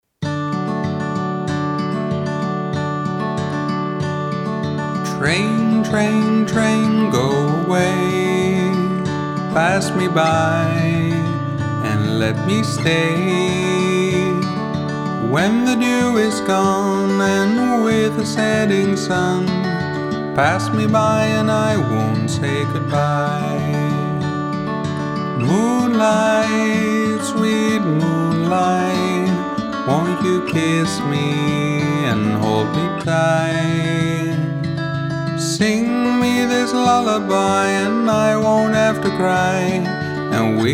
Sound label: Train Lullaby